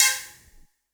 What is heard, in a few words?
attack C4, Yamaha VP-1; Music Store; VP-ATTACK